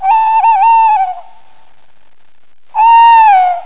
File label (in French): hulotte